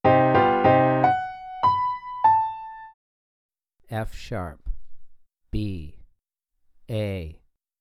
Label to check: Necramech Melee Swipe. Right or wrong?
Wrong